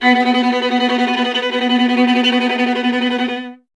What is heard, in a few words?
viola B; Miroslav Vitous; Solo Instruments VOL-1